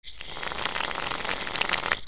BATHROOM

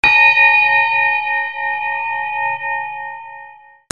BELLS

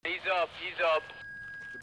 PHONES

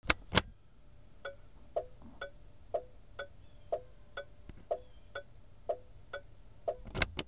CARS

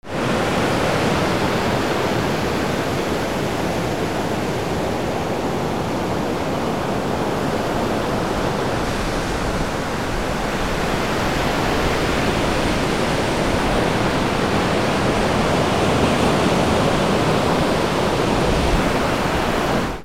WATER

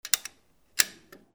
MONEY

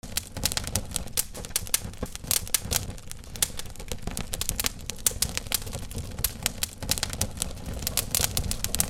FIRE

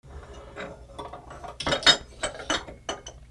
FALLING

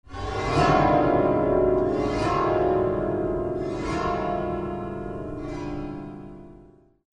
MUSICAL

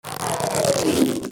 FRICTION

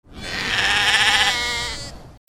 ANIMALS

